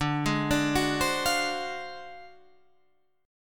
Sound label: D 7th Sharp 9th Flat 5th, Guitar, Drop D